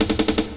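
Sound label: loop snare drum, 160 BPM, Drum & Bass; Ueberschall; Drum & Bass Resonance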